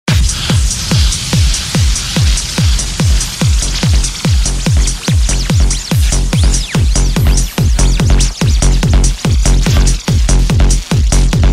Heavy Machine Rhythm; Geometry Dash

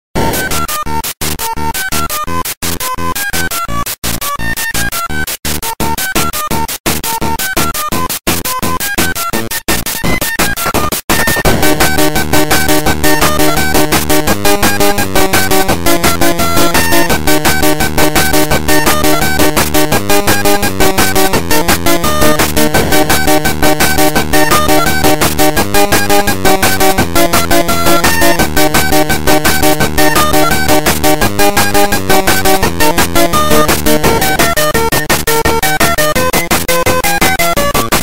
8bit jingle twist; Geometry Dash

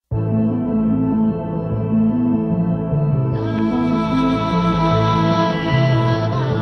Epic Orchestral Electronic Drama

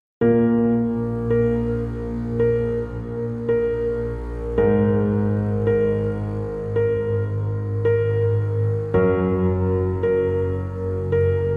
Dramatic Piano - Emotional Music - Epic Music

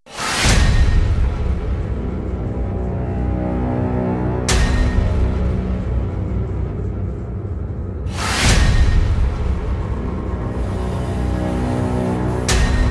Intense Massive Epic Hybrid Rock

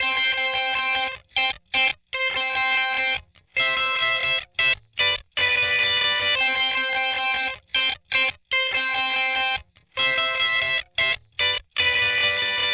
tempo, AUDIO ENGINEERING

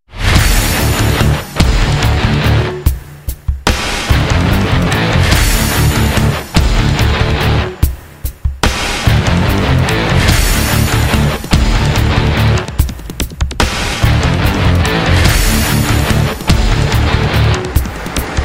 Epic Dark Heavy Rock

